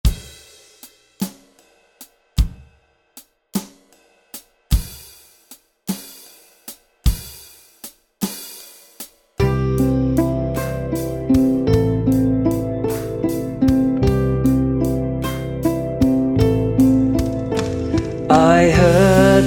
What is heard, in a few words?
Hallelujah vox